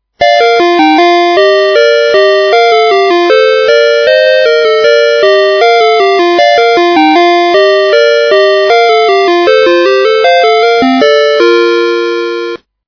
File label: London bridge is falling down, doorbell; 门铃音乐芯片; 伦敦桥